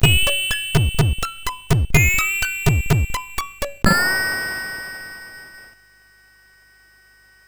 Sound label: sid MOS6581R2; Cube Magik